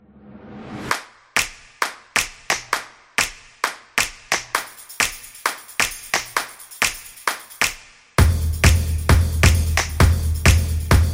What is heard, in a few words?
Snaps; high energy